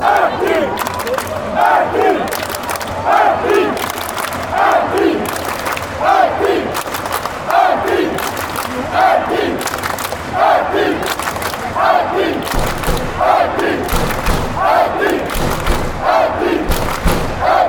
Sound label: chant, fanchants; randers